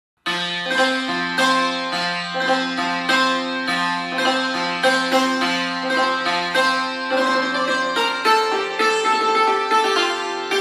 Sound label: Santur